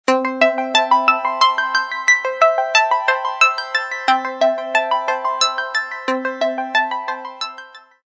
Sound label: Sleepy, Alarm; HTC Freestyle